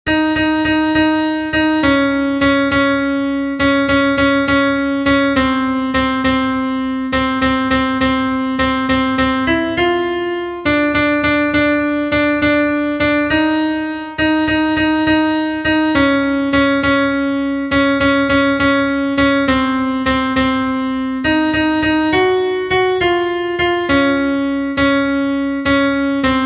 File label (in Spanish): CONTRALTO, himnos en piano; cantos del camino